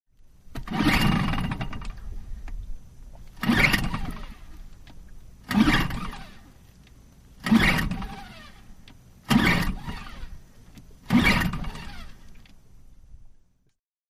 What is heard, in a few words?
Small Boat Motor, motorboats